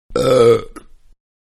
Burp-Loud; Burping; Human; Manhattan Production Music

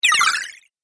Game App Health Boost, Video Game Sound Effects

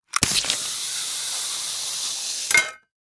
Warfare Grapple Gun Shoot Impact; Miscellaneous Combat; SFX Bible

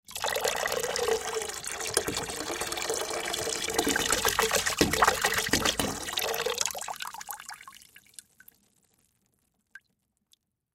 Pour Water, Pour Water Into Plastic Bucket Slow; Pouring; Blastwave

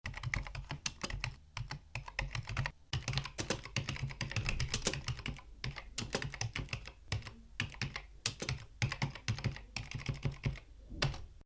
Typing keyboard, Keyboard (Computer): Typing; Offices; Stuart Duffield

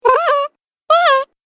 Cartoon Game Voice Laugh Sounds; Cartoon Voice Sound Effects

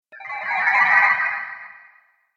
Spell Water Illusion, Magic Sound Effects

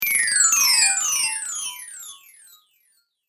Chimes High To Low Fast Delay, Windchime

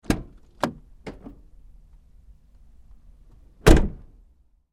Truck GMC Savana, Door, Open-Close, Med; Vehicles; 2010 GMC Savana,Door,Open-Close,Med; Trucks

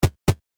Video Game Footsteps Jog Loop Vintage Eight Bit; Technology; Video Games

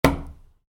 Wood, Light Impact: Brick; Wood Sound Effects; Wood (Sound Effects:Materials); Stuart Duffield

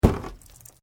Metal Light Movement Minor; Materials

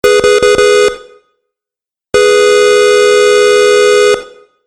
Car Horn Two Sounds; Car Sound Effects; vehicle

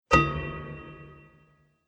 Clang; Clang Sound Effects; Impact

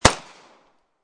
M9 9mm Barrel; War; VRSonic

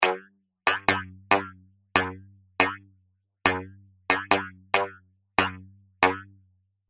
140 Bpm Droid Boogie Loop, Robot; Looping; Fantasy, Horror And Sci-Fi Games; Andrew Fleming

